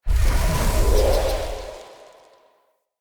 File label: Wyld Invis Cast; Fey; Saboteur